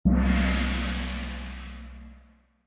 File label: orch cymbal hit, Orchestral cymbal hit.; Percussion Loops